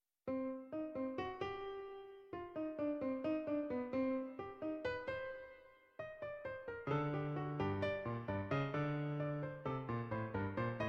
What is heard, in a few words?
fugue in C minor